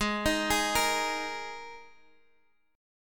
D sharp Suspended 4th G sharp

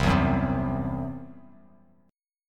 C-Major Flat 5th, Bass Standard; strummed

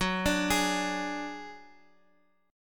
F sharp Suspended 2nd Sharp 5th, Guitar, Open D

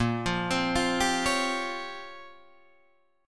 Bb Minor 6th Add 9th Bb down Guitar Open D

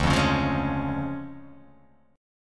D Minor Major 7th Flat 5th, D

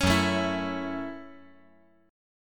Gb Minor 7th

